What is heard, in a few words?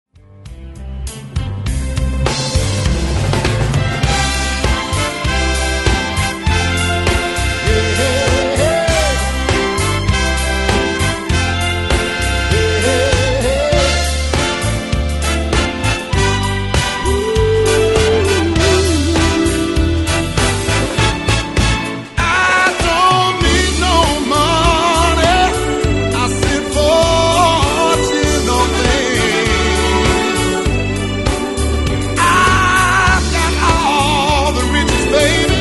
orchestra